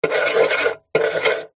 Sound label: office pen biro draw shape on metal box